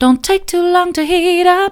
vocal samples; sampleradar

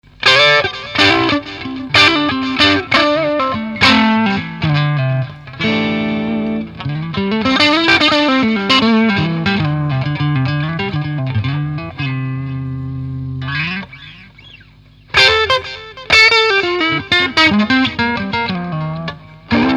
crunch; kong tube fifteen

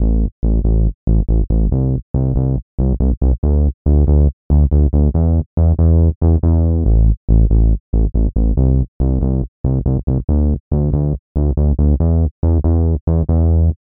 bass cho saws; rob papen subboombass testbericht

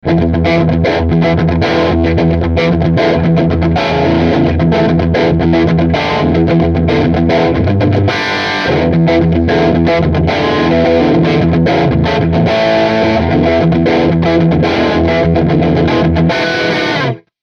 big and roomy; uad marshall plexi plugin; fet mikro

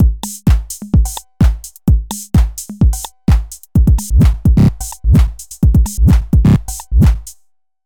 roland tr-8 scatter

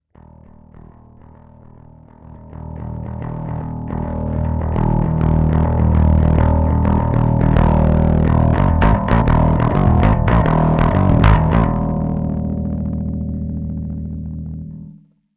palmer pocket bass amp fuzz drive plus

